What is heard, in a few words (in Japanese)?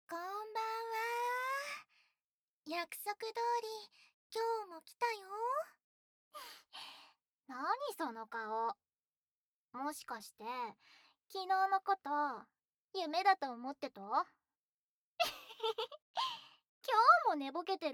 耳舐め＆フェラ; 2日目